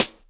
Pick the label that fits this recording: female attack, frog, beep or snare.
snare